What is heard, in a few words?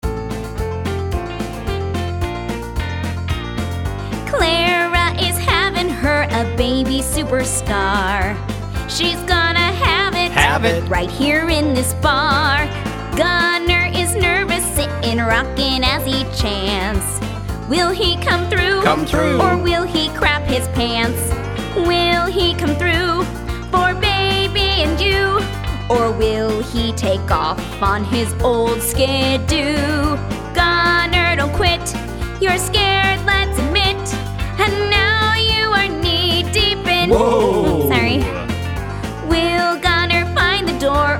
vocals